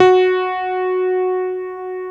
CP-80, Yamaha CP-80; Greytsounds VOL-1

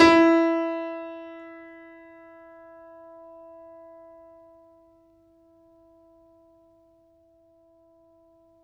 FF E 6 off, Grand Piano off mic; AKAI S6000 CD-ROM - Volume 2

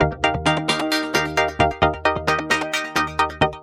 NRG Pad

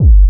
KICK; USB Soundscan vol.20 - Fresh Disco House I

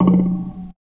PERC; Houseworx; Drums

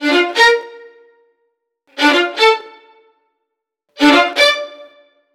STRING LICKS, Disco

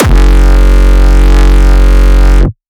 frenchcore kick

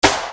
Snare Dr

